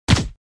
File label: shoot; pixel gun 3d; casanova